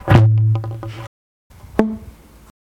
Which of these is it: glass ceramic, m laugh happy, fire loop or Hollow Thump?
Hollow Thump